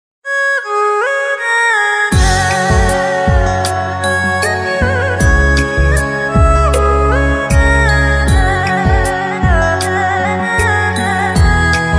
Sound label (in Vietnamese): Meo hoang beat